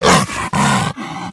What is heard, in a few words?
psy attack, pseudodog